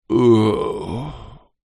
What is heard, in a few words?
human male middle aged groan hungover ill; zapsplat